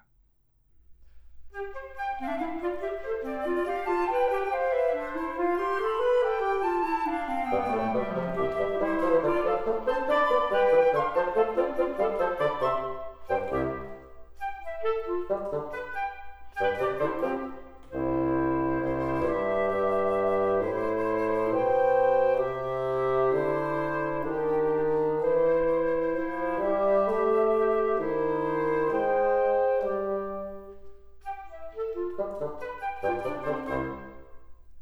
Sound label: Xy With Increased Ambience; The Best of Chesky Classics; Jazz and Audiophile Test Disk, Vol 3